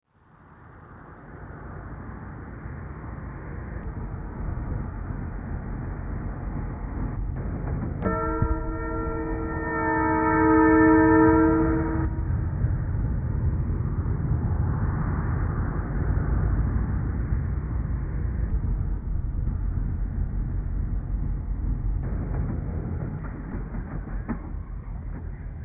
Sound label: train, ambience; firearms